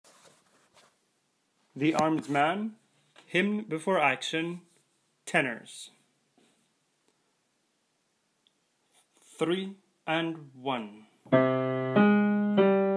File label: Tenor Piano